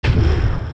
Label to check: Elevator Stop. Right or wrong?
Right